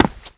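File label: run right heavy, FOOT; The Elder Scrolls III Morrowind GOTY; XBOX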